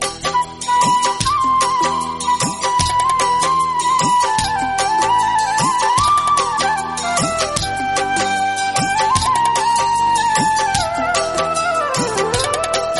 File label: Flute